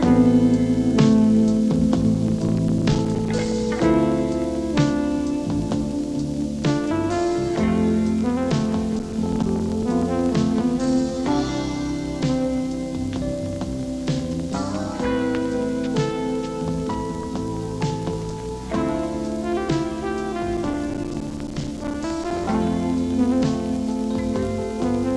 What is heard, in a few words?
Synth Theme